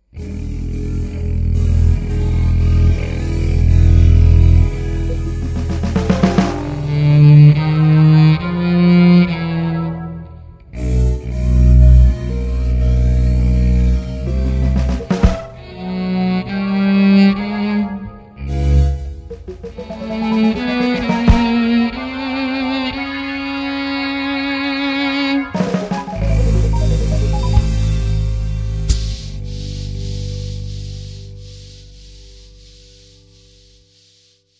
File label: multivoicerserializer bass; ddrum4